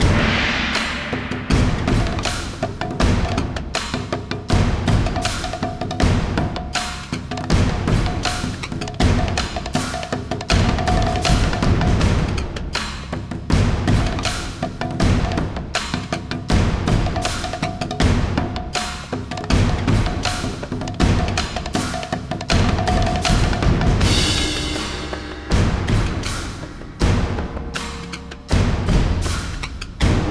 General Combat; The Witcher 3 Wild Hunt; Ard Skellig

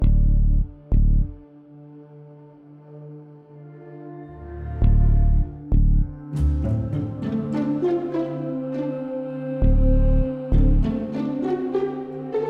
Base Loop; bakugan champions of vestroia 2020; switch gamerip

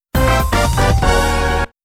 Mission Failure Fanfare; mario superstar baseball gamecube ost

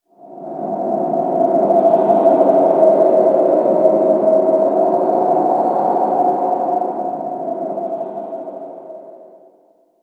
environment wind stinger

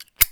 Menu select; In the Line of Duty Firefighter

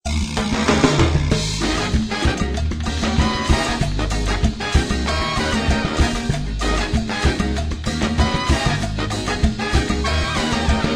Cumbia; gamerip

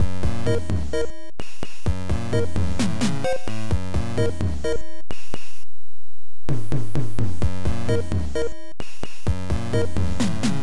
Metallic Shaker; family computer; Metal Max

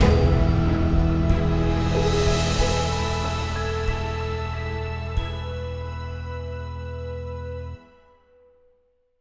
Sting brooding comedy Short; bakugan champions of vestroia 2020; switch gamerip

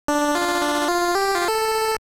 Engine Starts; Turbotime; Famicom; Game Start

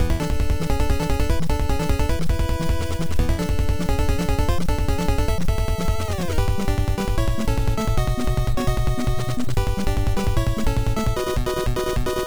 Boss BGM; Konami Wai Wai World; Family Computer; Shooting Stage

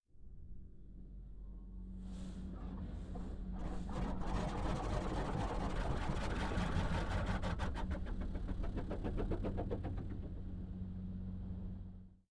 Carwash Power; this is the zodiac speaking 2020 gamerip switch ps4 xbox one pc macos

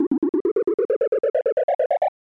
Unused Jingle; SNES Burn-In Test Cart

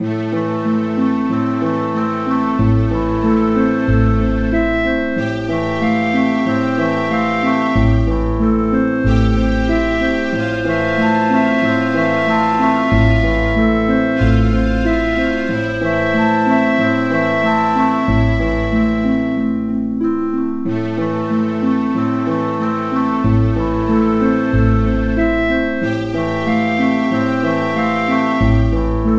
Tune Loop; marie antoinette and the disciples of loki; nds

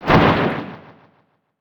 punch windup; Fight Knight

